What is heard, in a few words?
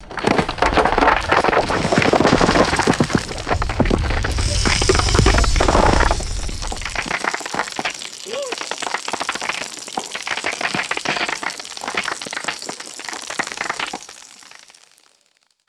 medium rocks tumbling and rolling